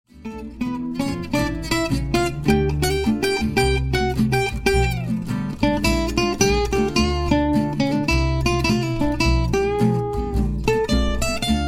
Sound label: blue drag trio